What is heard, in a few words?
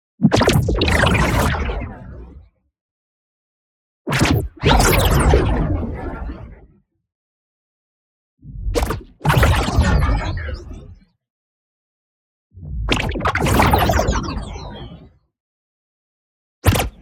ABILITY RELEASE Liquid Vortex; boom library; Matter